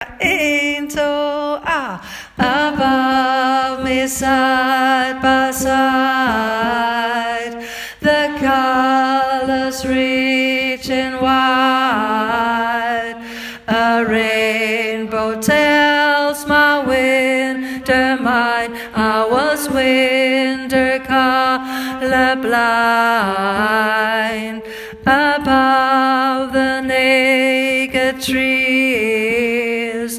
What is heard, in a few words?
tenor